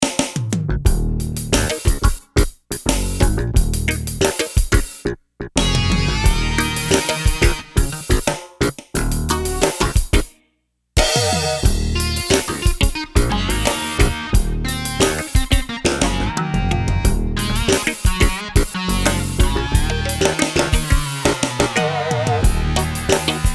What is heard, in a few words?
Funk; New Years